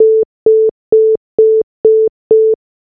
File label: Busy